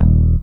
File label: Bass Note; Sonic Foundry (Sony Creative Software); Synchro Funk; One Shot Sonics